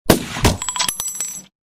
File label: sniper hit